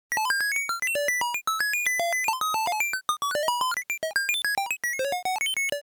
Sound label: Science fiction vintage data transmission